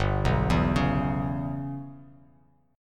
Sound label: G 6th Flat 5th, Bass Standard, G6b5 chord; G6b5 arpeggiated; JGuitar